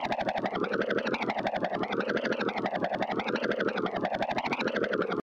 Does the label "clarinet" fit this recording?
No